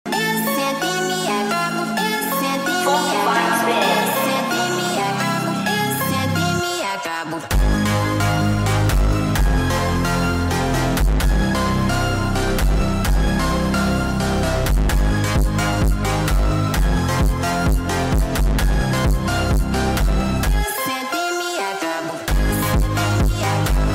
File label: phonk funk